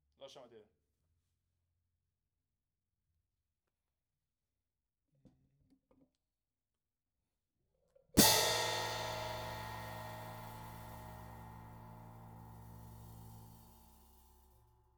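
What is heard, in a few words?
Hi-Hat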